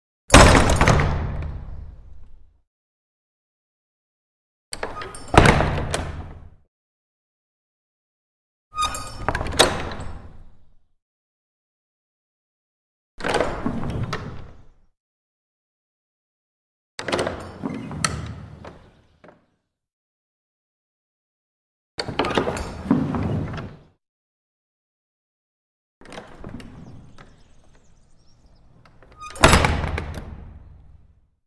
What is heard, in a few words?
Large Wooden Main Gate; Hollywood Edge Historical Series; cd4 Castles Palaces & Monasteries